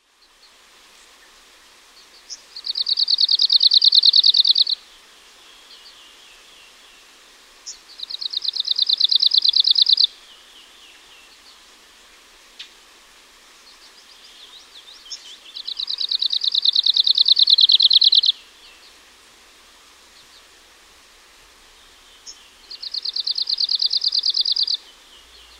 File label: arctic warbler